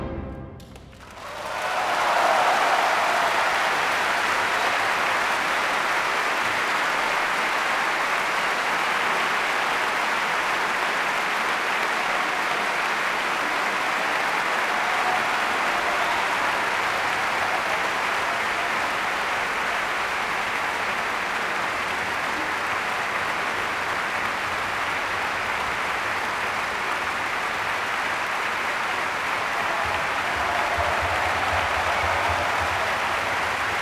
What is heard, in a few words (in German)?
Applaus; Ravel Pictures at an Exhibition